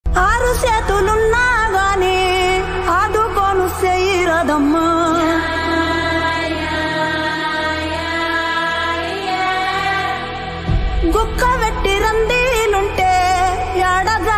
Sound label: telugu ringtone